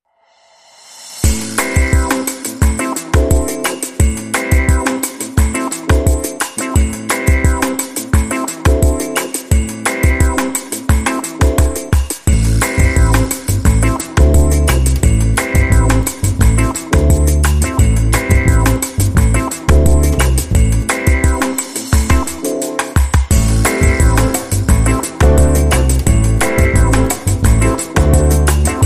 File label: Wah Wah